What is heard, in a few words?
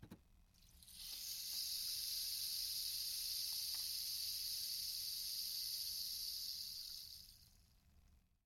rainstick